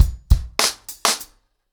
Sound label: 100BPM END, ACOUSTIC DRUMS; The Roots Drumloops